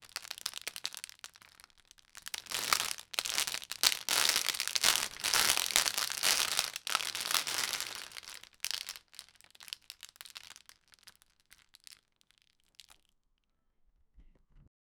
sound of choclate plastic, breakdown

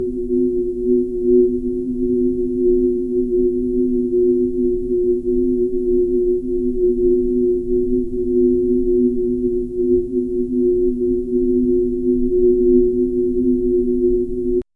faint cosmic hum with; nature

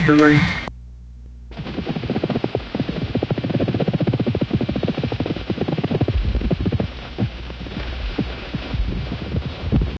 blizzard wails snow thuds

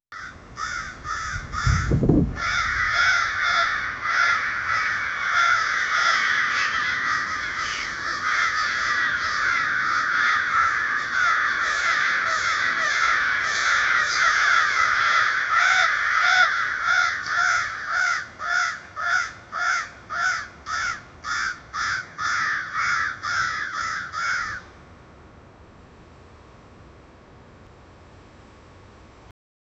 the sound of crows slowly

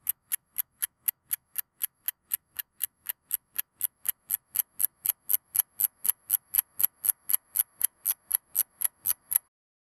fast forward sound of a, clock

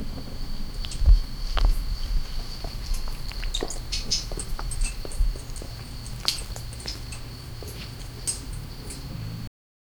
paws tapping softly on cobblestone

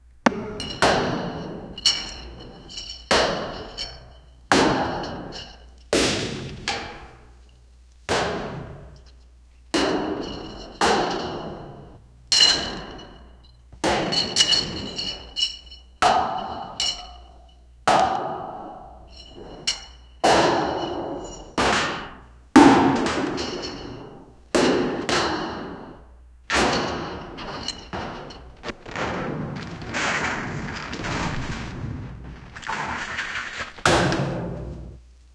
multiple miner collisions; clothing rustle

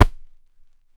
axe impact on leather

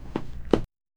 a step sound using boot; sound effect

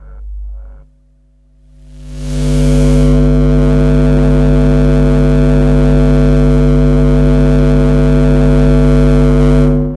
futuristic electro magnet, electricity

